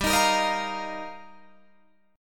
G Minor 7th Sharp 5th, Guitar, Drop D

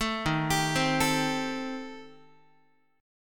Eb 6th Flat 5th, Guitar; arpeggiated; 12,11,10,10,13,x

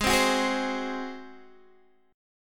Gb Suspended 2nd Flat 5th Ab

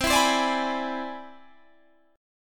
C Augmented Major 9th

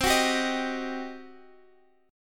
G Major 7th Suspended 4th Sharp 5th C

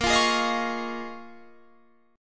C sharp 6th Add 9th A sharp

